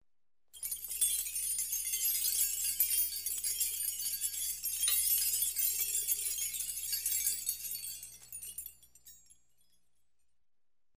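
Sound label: noise of small bells like jingling of keys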